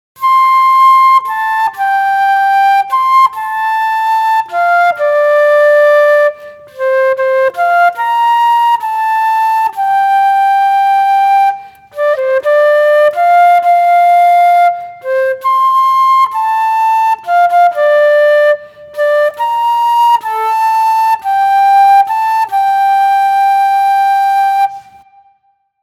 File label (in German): Querfloete